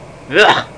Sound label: ack; Sound Library 2000; Wayzata Technology; People